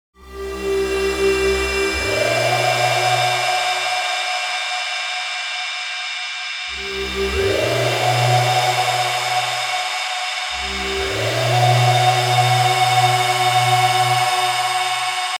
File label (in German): mit effekten garniert; bx oberhausen